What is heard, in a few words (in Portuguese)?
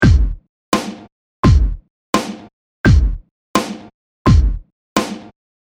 seminimas, bateria; tacao de bateria